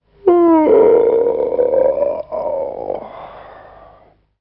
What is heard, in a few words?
yawn